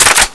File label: aug bolt slap; czero opforce